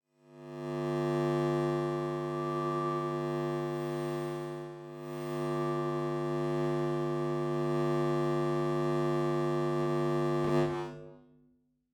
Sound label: Square Buzz